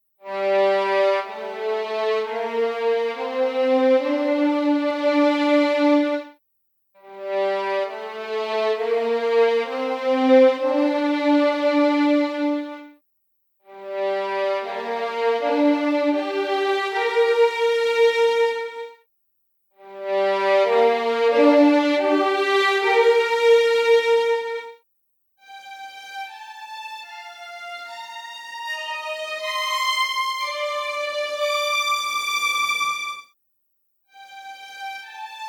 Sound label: First Violins Test Legato; LSS